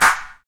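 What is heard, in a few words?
snare drum; 90 s Sample CDs; Sound & Vision - Gigapack I CD 1 (Roland); Dance-Kit 19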